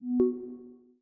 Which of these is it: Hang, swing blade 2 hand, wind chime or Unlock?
Unlock